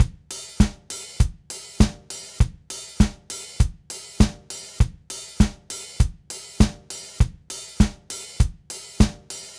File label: Ska, Drumset; Sonic Foundry (Sony Creative Software); Joe Vitale Latin Percussion